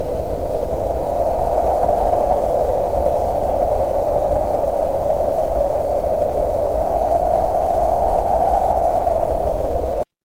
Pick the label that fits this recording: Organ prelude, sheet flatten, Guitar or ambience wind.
ambience wind